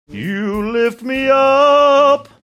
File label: singing